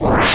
Deactivate Sound; SpeedChopper

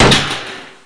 SHEET; PC Gamer 4.2; mtm2demo

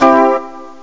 chuk fuzz major; Terra Sound Library; construction set; pads n strings

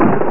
Shoot

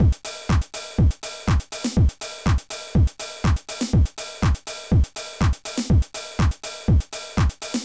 house (122 bpm); octabeats

